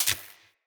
Shield Orb Zap

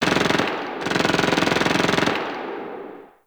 Distant Gunfire; Warframe; Ambience; NineteenNinetyNine

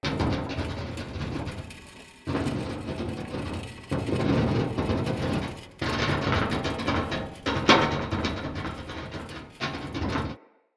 Siege Engine Turret Pivot Loop; Weapons; Enemy; Artillery; Ode Siege Engine

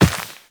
Walk Dirt, Footsteps; Operator

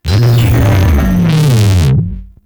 Attack; Warframe; Corpus; Jackal Redux

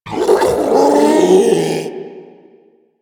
Infested Ancient Strike Scream; Warframe

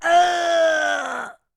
Injured Ostrons Grunt; Warframe; Tutorial

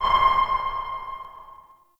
Interior Orbital Station Battle Map Ping; Warframe; Tau

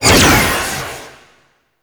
Scythe Proj Whizz; Stalker Jade Scythe; Warframe; Weapons

